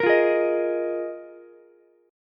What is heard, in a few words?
D sharp Suspended 2nd Suspended 4th, Ukulele; strummed; chord; JGuitar